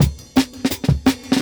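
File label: Jungle Breaks, 170 bpm; Record; Original Jungle Breaks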